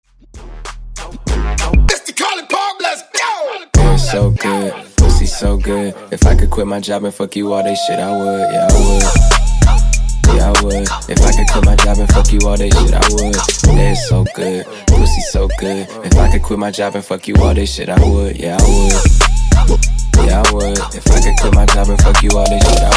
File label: Extended Redrum; Clean n Dirty